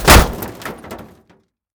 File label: metal, combat, abilities, big claw